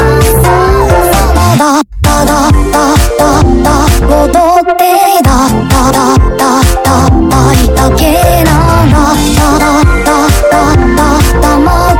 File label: rocket barrage air raid; mcginnis is a vocal synth fan; forge; doomer